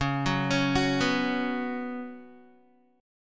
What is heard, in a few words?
Db 7th Suspended 4th Db down Guitar; arpeggiated